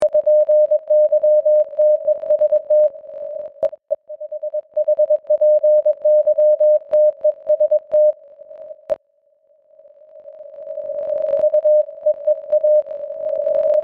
CW; First RX TX Qso

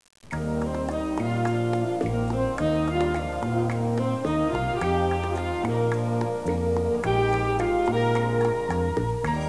Rumba

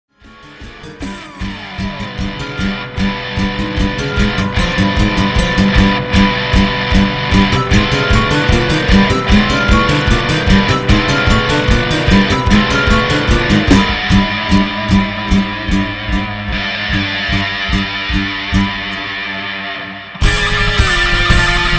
guitar solo

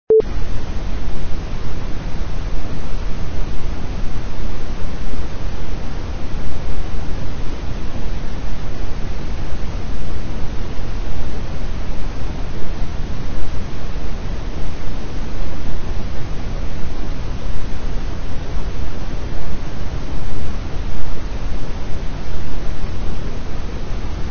brown noise

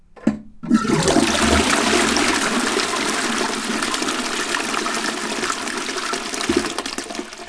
FLUSH

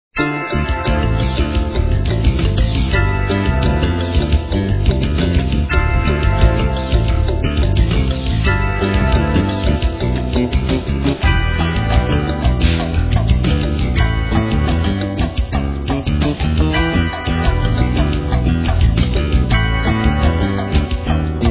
jazz

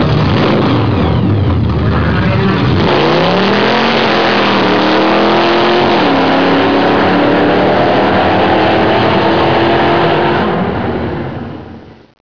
blower

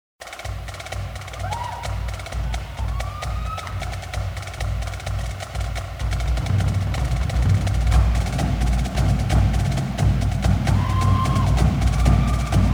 electric wheelchair cadence stadium

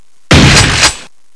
shotgun

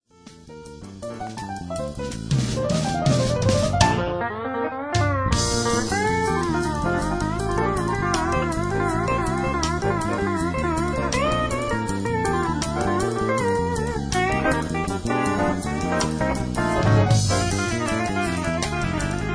solo out

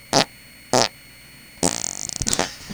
fart

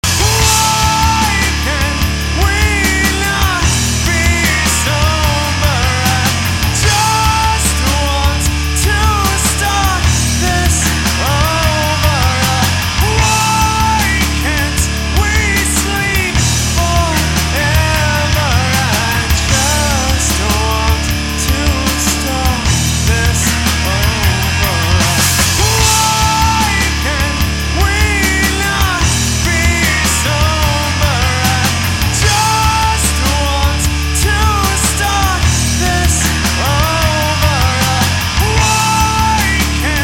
Sober Loop Heavy

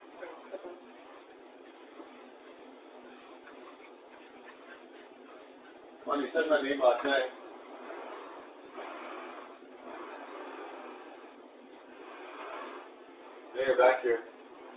breathing sounds

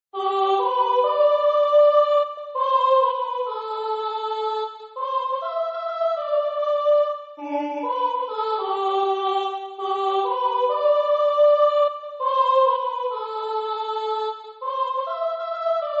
Balia Soprano

